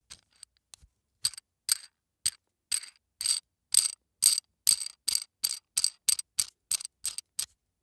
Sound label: metal screw nut sequence long